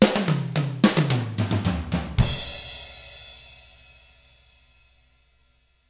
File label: POP, Drums; ILIO; Double Platinum Drums 1